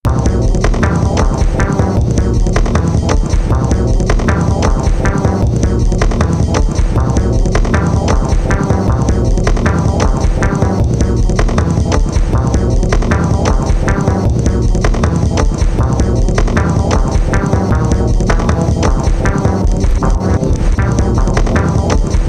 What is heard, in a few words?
hang up